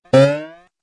jump; Barrel-Jump

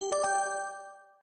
buttons; billards

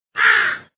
crow; cowboyrun

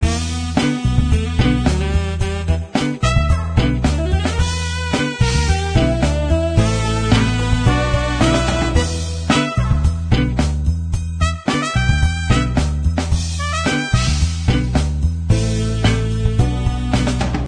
gamemusic; CasinoCardsMemory

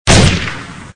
shot; zombbieshooter

